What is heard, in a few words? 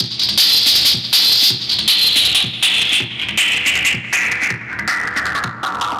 beat, drum & bass beats & breaks; Ebony; Sonic Foundry (Sony Creative Software); Fluid Dynamics Drum & Bass